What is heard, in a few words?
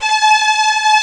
marcato; Greytsounds